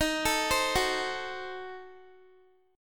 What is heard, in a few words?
D sharp Diminished 7th D sharp, Guitar; D#dim7; arpeggiated; x,18,19,17,18,x; 4E,4A,5D,5G,5C,6F; chord shape